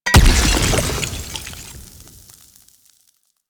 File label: Oil Grenade Explosion; Abilities; Nechro Mech